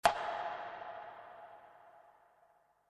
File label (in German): filmmusik